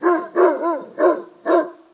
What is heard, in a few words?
Dog Bark; Zomboid 41.78.16; Project Zomboid